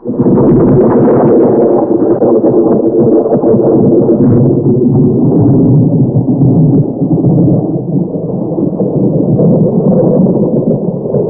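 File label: rolling thunder; PC Gamer IT CD 41; Daikatana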